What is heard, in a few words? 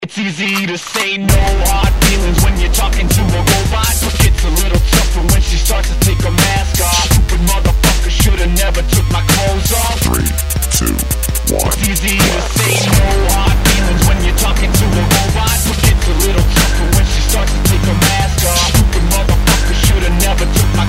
robot